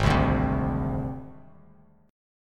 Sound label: Ab Major Flat 5th Ab Bass Standard; AbMb5 strummed; JGuitar